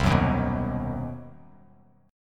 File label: C Minor Major 7th Flat 5th chord, Bass Standard; strummed; JGuitar